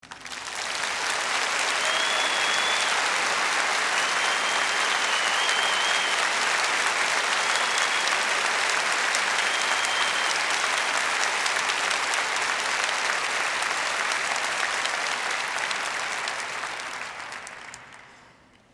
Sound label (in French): Applaudissements